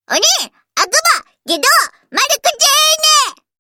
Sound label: dark hop